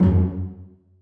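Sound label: celli pizzicato f#2; Sonatina Symphonic Orchestra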